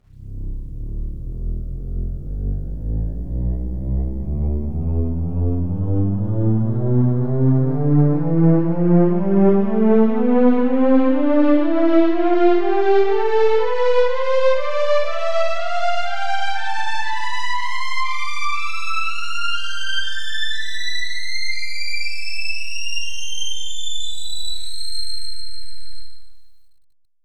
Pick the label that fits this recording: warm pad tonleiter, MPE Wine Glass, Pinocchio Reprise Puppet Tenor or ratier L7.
warm pad tonleiter